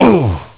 fall; duke

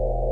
laser

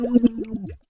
gurp; ogro

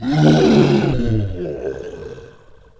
infantry idle

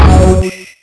bump

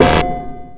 fall

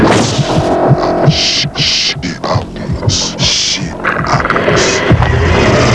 bump; predator

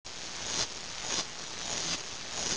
high volt; baseq2; electric

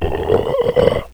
death

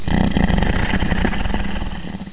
weird; lmctf

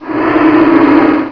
tech; baseq2; ctf

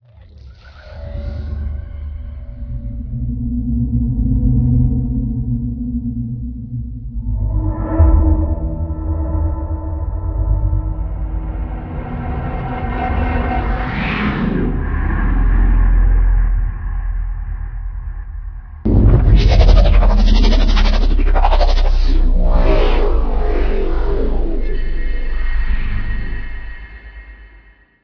ambiente; spawngirlsounds

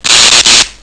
pain; opentdm; HIRo

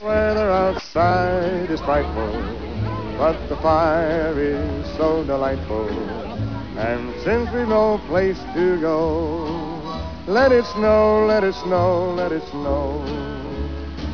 xmas

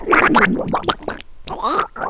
DROWN; street walker

